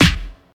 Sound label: fresh hip hop steel snare drum sound e key